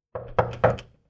wood door knocking outside 3 times

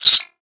ratchet; soundscrate

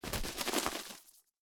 Bird Wings Fly Away; SoundsCrate

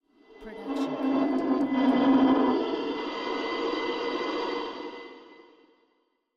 Distorted Haunting Voices; soundscrate

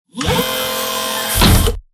Robotic Movement; SoundsCrate

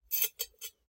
Glass Shuffle; SoundsCrate

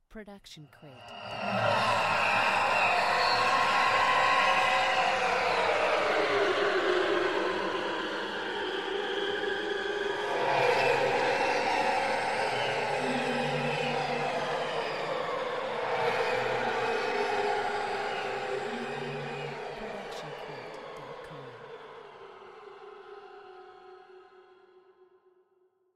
high cluster chaos; soundscrate

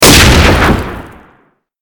Explosion; SoundsCrate

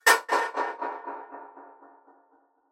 Eerie Metal Hit Echo; SoundsCrate

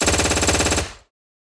Assault Rifle; SoundsCrate

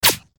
Bullet Flyby; SOUNDSCRATE

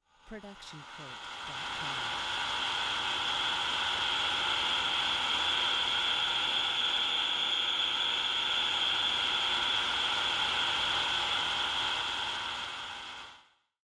Screamy Screetchy; soundscrate

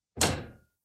Metal Footfall; soundscrate

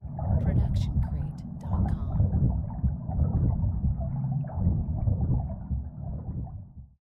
underwater swimming; soundscrate

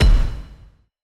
Epic Metal Hit; soundscrate

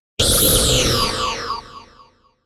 Anime Super Laser

